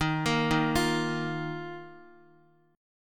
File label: Eb Suspended 2nd Guitar, chord; Ebsus2 arpeggiated; JGuitar